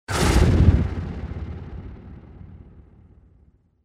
hit flutter; overte; partyBall

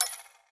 machinegun ric; weapons

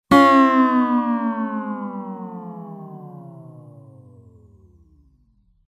Looser sound effect; overte; partyBall

